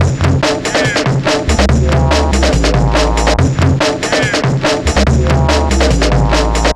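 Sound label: 142 D minor, Hardcore Breakbeat; Big Fish Audio; Hardcore Breakbeat Action; Shake That Booty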